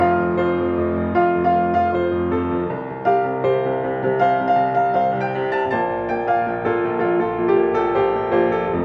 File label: Animal piano